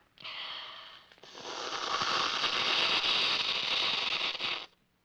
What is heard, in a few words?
Child Soup Slurp; Vintage Cartoon; Comedy Vocals